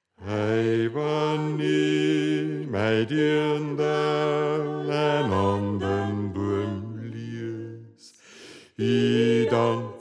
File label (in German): Bass; Ei Wann I Mei Deanderl